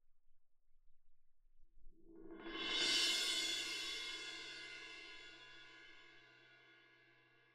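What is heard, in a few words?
Cymbal Main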